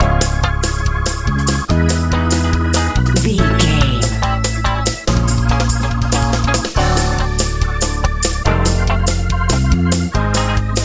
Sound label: hopeful warm echo head dreams praise funk